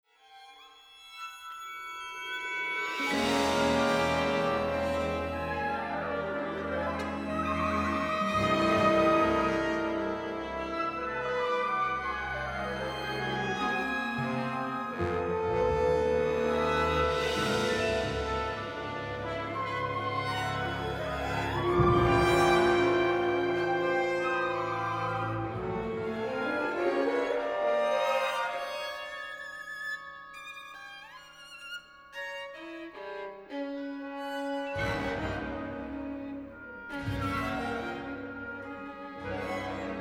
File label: VIOLIN CONCERTO